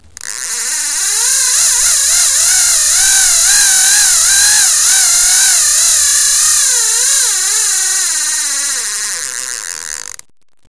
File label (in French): Depart au frein